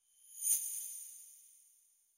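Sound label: tamborin svish rapsodiz, tambourine swish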